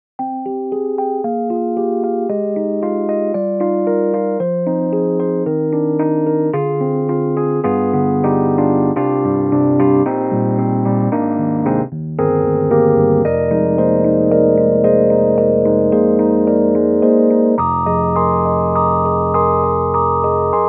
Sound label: Rhodes 88 Suitcase; EastWest; Quantum Leap Goliath